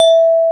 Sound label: xylo E